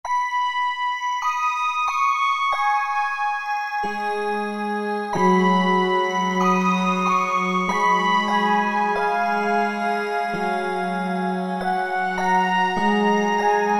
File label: Harmo Pan, Roland D-110; Factory Patches